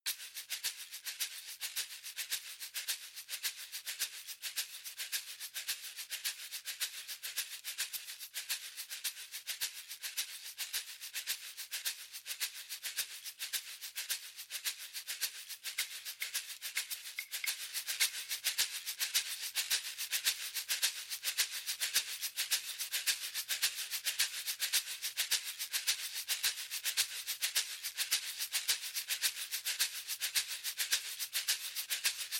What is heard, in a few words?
small perc only